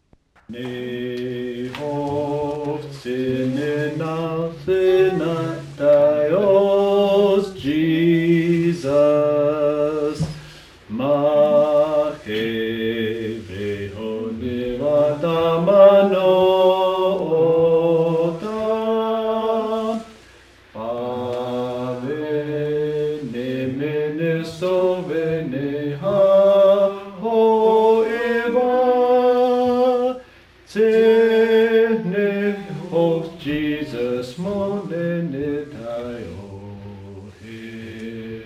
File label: hymnbook songs, cheyenne language; Ma'xevehonevatamano'ota